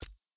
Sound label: button circle x click; lol